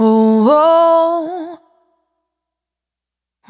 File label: Urban, Single Voice Melodic Loops, Vocal Samples